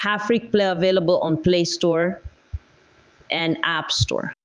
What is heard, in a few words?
Jingle; Hafrikplay